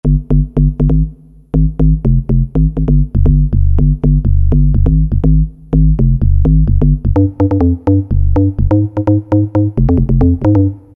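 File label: Perc DX bass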